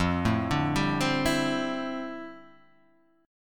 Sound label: D Minor 11th F